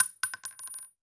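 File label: bullet case bounce 308 cement close large; casings; gmod; viper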